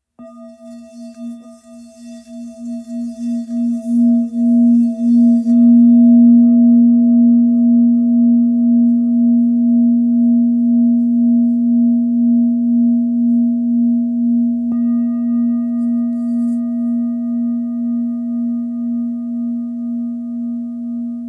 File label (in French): Bol ancien la diese 815g